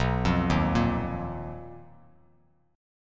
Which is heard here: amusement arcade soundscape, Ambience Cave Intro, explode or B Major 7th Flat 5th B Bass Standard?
B Major 7th Flat 5th B Bass Standard